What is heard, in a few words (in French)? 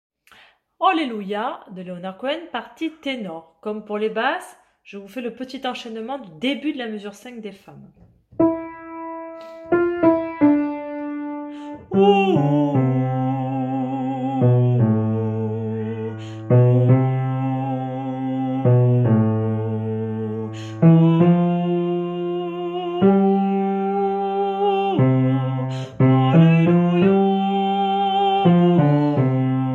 partie tenor